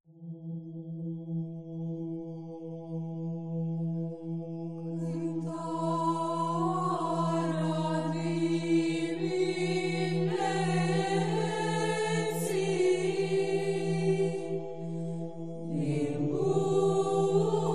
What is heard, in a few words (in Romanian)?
Cantarea diminetii glas 5